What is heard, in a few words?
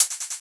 hat, drum sample; Hats